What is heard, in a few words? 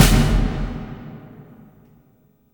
DEATH MARC, AMBIENT DRMS; Spectrasonic Distorted Reality 1